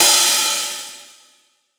Hihat Open Acoustified

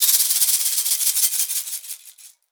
Perc Shaken, One Shots, Drums, Misc Percussion

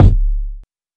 Kick; Ableton Live 12 Lite Core Library

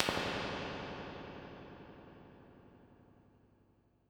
Springs Classic Amp Spring, impulse responses; Ableton Live 12 Lite; Hybrid

